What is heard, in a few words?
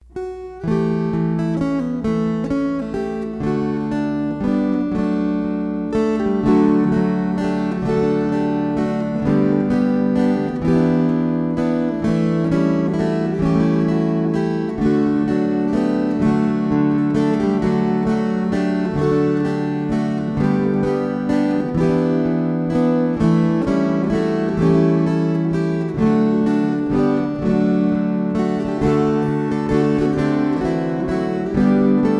Waltzes